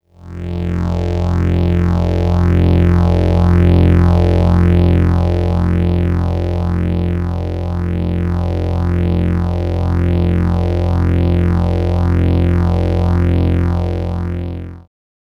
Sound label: Synth, Trip-Hop Loops; Sonic Foundry (Sony Creative Software); Rondo Brothers Trip-Hop Loops & Samples